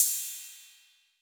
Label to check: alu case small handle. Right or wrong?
Wrong